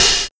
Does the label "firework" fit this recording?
No